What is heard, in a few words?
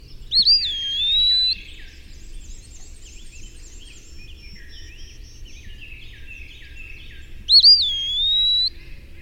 eastern wood peewee